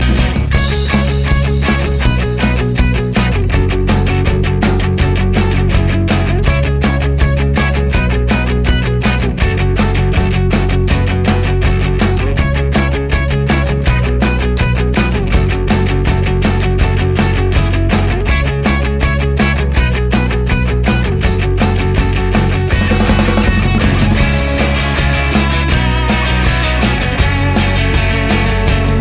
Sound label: Punk Rock